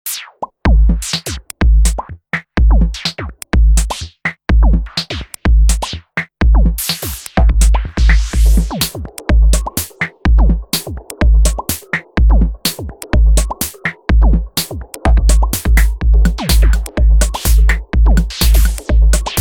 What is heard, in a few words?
Ploptic; Nokia N8